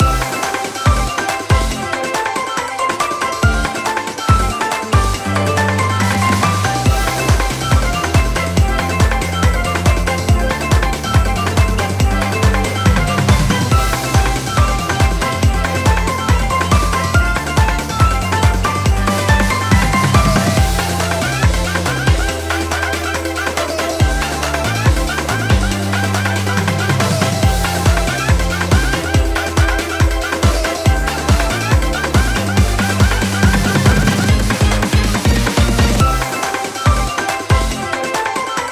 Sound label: BGM ENTRY